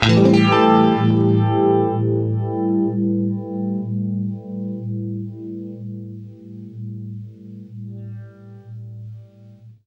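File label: univibe Am7 right, guitar; East West; Steve Stevens Guitar Samples Collection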